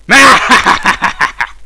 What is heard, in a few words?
mhahaha